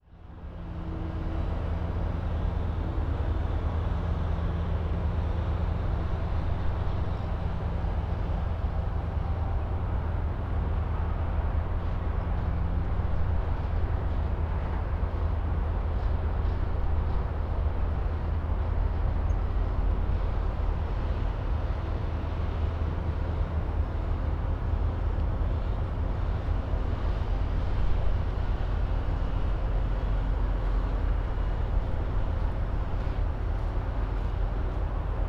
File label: Ambience, Industrial; M8